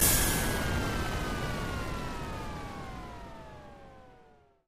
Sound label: car turbo end; lightning speed